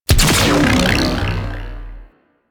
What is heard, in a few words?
Split Laser Shot; Warframe; Corpus